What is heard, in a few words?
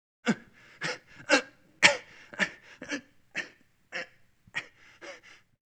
man groaning grunting straining; Soccer; Butterfinger Video Sound Effects; Soccer Running Chainlink and Huh